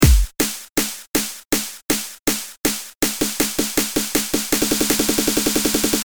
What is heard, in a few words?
drum